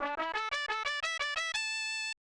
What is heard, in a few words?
Player Win; Kabuki-chou Reach Mahjong Tonpuu Sen; SNES